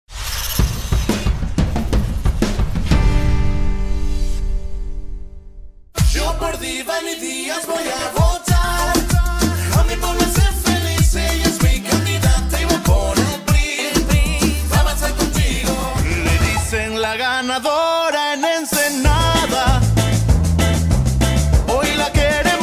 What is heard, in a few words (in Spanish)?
Jingles Políticos; Castel Records